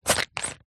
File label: household hair gel pouch noise squeeze empty just air; zapsplat